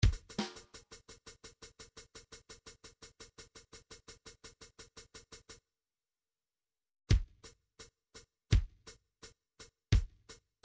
drums hh, midi; Paul Kodish Dangerous Drums

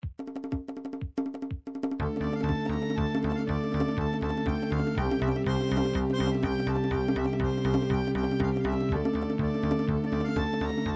russian lezginka, midi

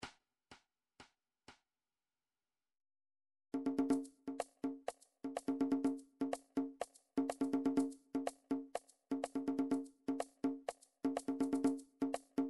drum trax per

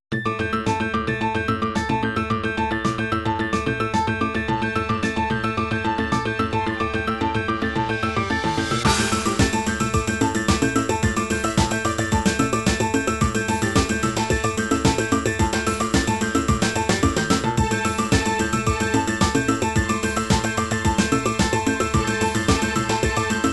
ringtones, midi

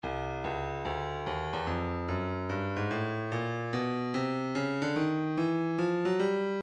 breakbeat midi 073 bpm; blue note b; Stylus Breakbeat Midi Files

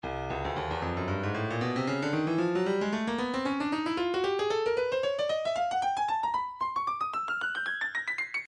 breakbeat midi 114 bpm; Bourbon Street B; Stylus Breakbeat Midi Files

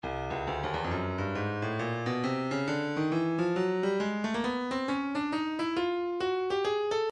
breakbeat 068 bpm; Stylus Breakbeat Midi Files 065 069 Bpm; Grime A

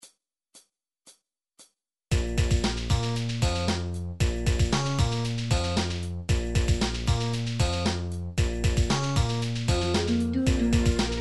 slow, midi

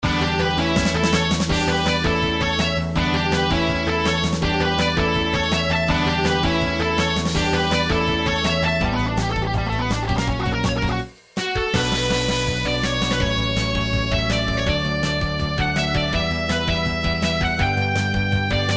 title screen hard hitting remix; nintendo double dragon

